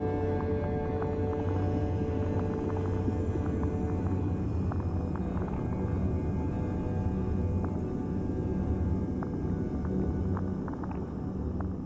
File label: Storm; Avatar The Last Airbender